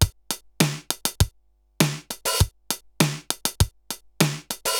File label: RX17 (100BPM), cyborg beats; musicradar